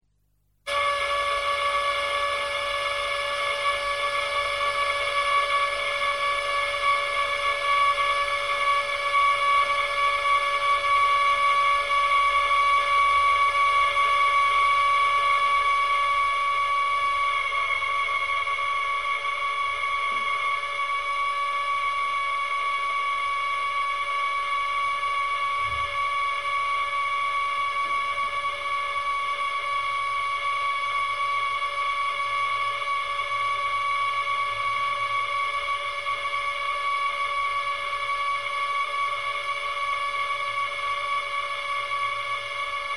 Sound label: Water Pipes Making Strange Noises; SOUND EFFECTS1